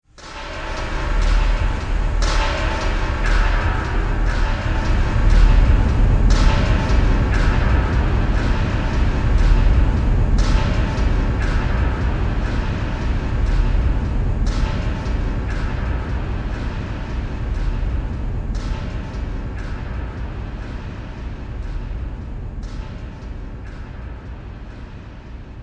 Fantasy Scene Mines Of Chaos, scary soundscape; Twistedtracks

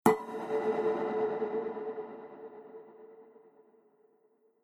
Clang; Clang Sound Effects; Impact

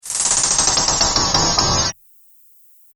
Whoosh Slow Down Stutter

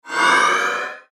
Whoosh Chime Phaser Swell; Blastwave; Whoosh Sound Effects

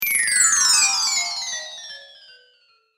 Chimes High To Low Fast Delay, Windchime

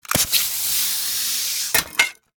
Warfare Grapple Gun Shoot Impact; Miscellaneous Combat; SFX Bible

